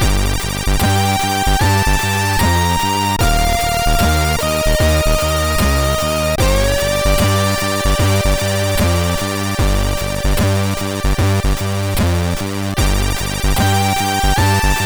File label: sid MOS6581R3; Paddlepong